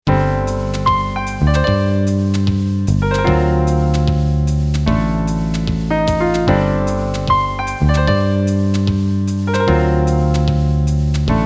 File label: jazz, jazz fusion